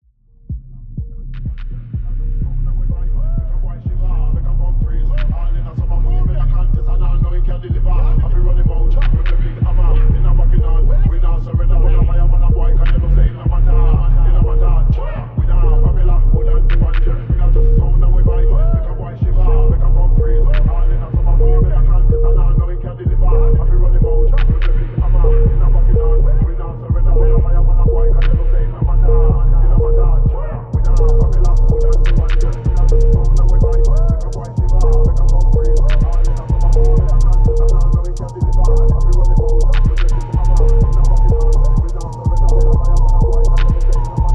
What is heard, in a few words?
Focus Soundscape; Endel